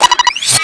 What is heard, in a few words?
bomb pre explode; Valorant; raze